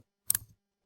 mouse

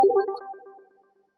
synth pluck

